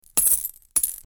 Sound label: Coins dropping